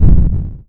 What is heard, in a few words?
Bit Cannon